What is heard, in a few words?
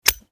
relady; taurus; daffas arsenal; tacz